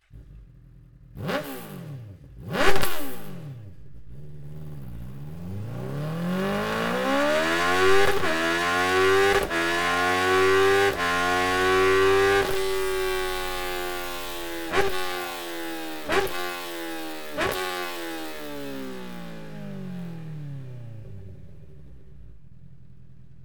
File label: slip on optheader; akrapovic